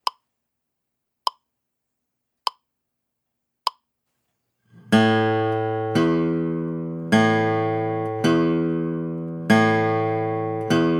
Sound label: Alternating Bass